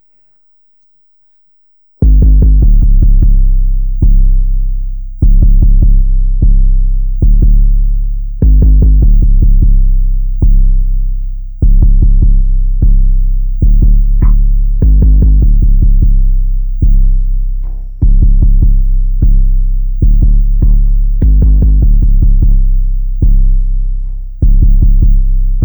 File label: bass